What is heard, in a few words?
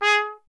Trumpet A; Miroslav Vitous - Solo Instruments VOL-1